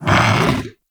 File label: Vocal Death; Hunting Critters; Undazoa